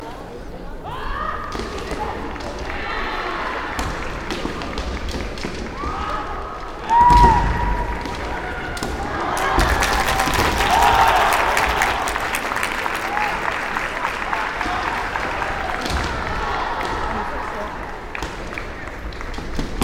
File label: Kendo spectator